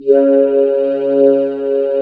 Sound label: VOCODER; Sound & Vision - Gigapack I CD 2